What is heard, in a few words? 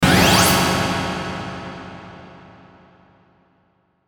Ascend Metal Reverb Hit; Production Element Imaging Element Accent Transition; Ascending